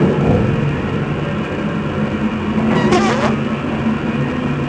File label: Lift Run; Grand Theft Auto Vice City; XBOX